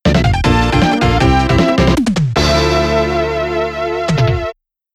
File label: Game Over (Retro); Wild Guns Reloaded